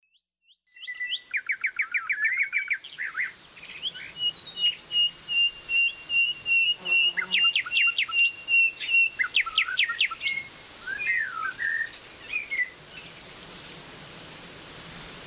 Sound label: Summer Meadow, Soundscapes